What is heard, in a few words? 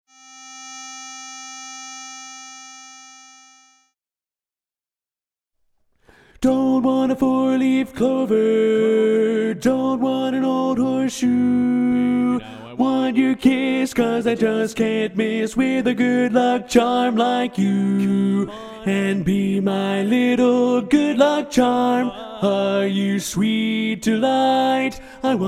baritone predominant